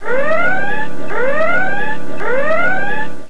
red alert; Scientific Logbook

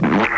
Squish; SoftKey

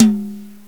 Jazz Tom; XG

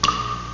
DRIP; FinalOdyssey

